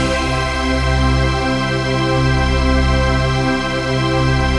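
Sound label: chord pad, Pads; Best Service; XX Large - Pads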